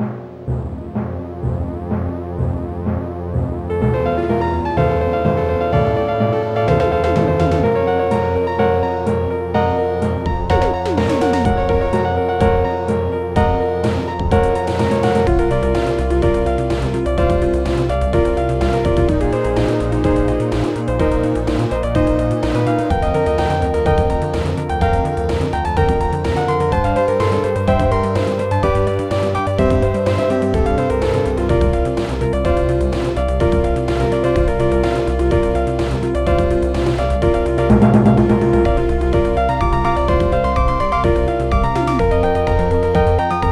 Piano Session; MusicMaker V8

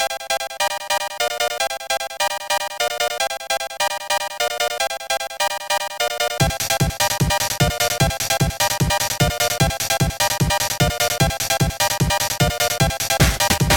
trash techno, Trackers; Modland

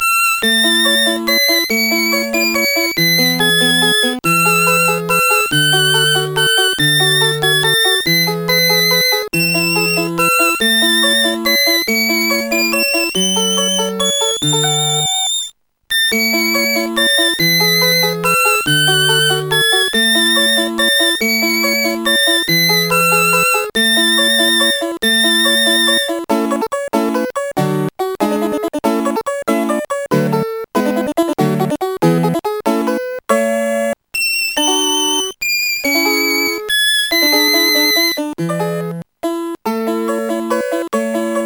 tavern; Sonix Music Driver

